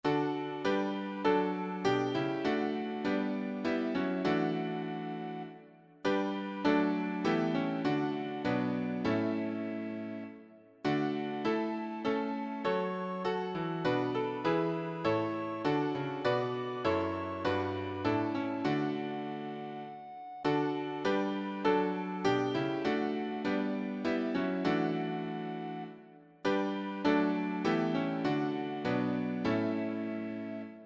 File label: Piano; 6º Música Primavera, 7º-8º Música Periodos, 7º-8º Música Primavera